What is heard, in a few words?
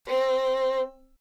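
violin do; violon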